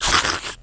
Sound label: houndeye idle; gearbox